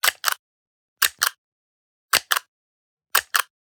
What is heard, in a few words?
Generic Button Plastic Medium